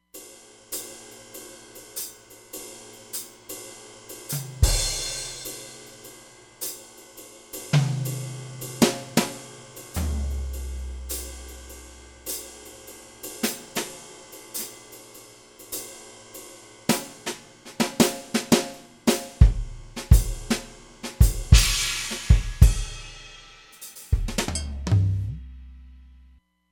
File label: Brush Jz Kit, Roland Fantom XR; Drums